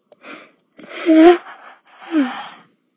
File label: Yawn